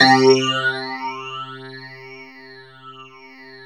PNO PH C3 R; USB Soundscan vol.51; House Side Of 2 Step; AKAI; PIANOS

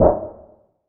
perc organic abyss

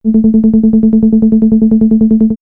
Tones, synth fx; StarWars; X-Static Goldmine

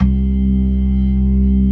ORGAN, C3 NO PERC; Keith Emerson Signature Series

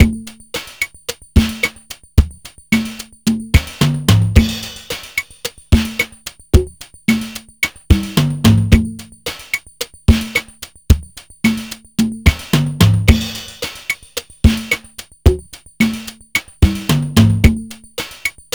HIPHOP; INIS - Funky Xtreams

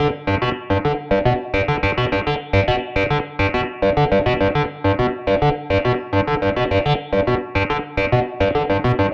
Clav, Indie Rock; Sonic Foundry (Sony Creative Software); Indie Rock Anthems

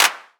perc classic clap; percussion

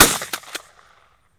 SHOT; Deadly3D; E-MU Producer Series Vol. 5 – 3-D Audio Collection; 3D Percussives

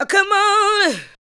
ADLIBS 90; Ueberschall; Jam Box

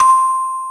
KEYS, Drum & Bass, 150 BPM; Ueberschall; Drum & Bass Flash Trackz

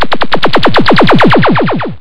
lazer; jazz jackrabbit 1; M8

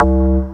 ping; Sonic Foundry (Sony Creative Software); Synchro Funk; One Shot Sonics

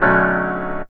CHORD R, Drum & Bass 160 BPM; Ueberschall; Drum & Bass Flash Trackz

